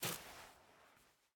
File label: Take item; miside demo